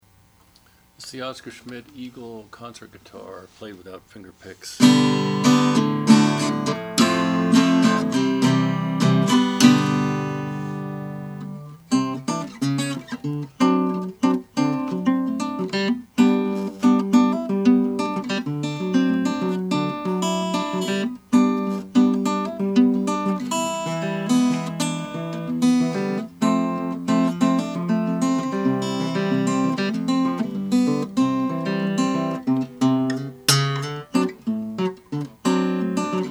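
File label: oscar schmidt floral decalcomania, Guitars; 1930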